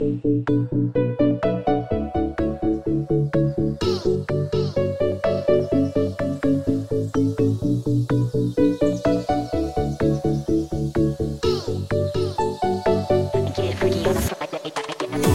Vaporwave Menu

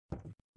walk wood

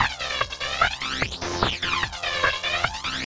Music Broken Loop; Mini Game

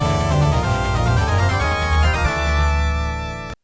Success Jingle, pinball; Video Mode

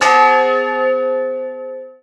Old Clock

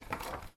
page flip, mono; dokobots

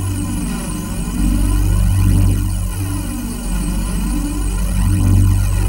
Techy Energy Shield

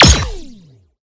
Sciweap Shot Medium Laser Assault Rifle

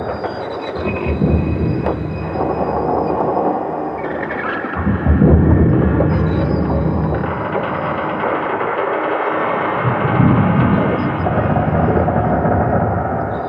docks ambient

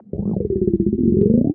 belly blurp

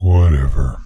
Meow

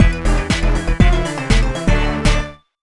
Sting End Of Mission; Ginormica; Monsters vs Aliens; NDS

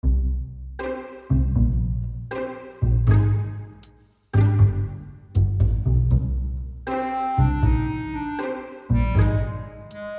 Blues; The Henry Stickmin Collection

